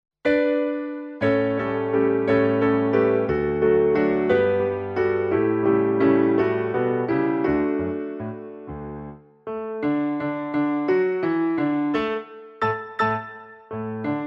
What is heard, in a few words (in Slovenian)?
mlin klavir; OB BISTREM POTOKU JE MLIN